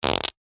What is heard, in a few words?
human fart dry short; zapsplat